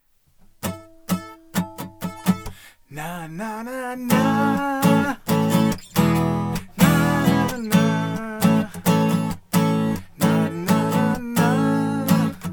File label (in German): Vocals 12 String Sprachkabine gedämmt